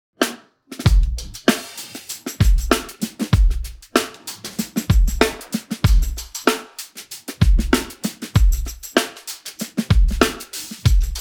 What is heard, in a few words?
pattern, drums